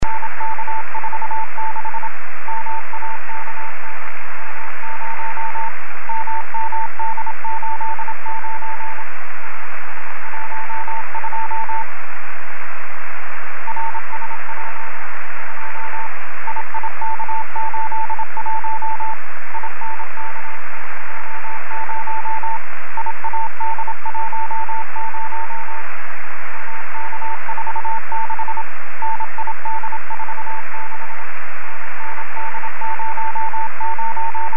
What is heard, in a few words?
beacon, amsat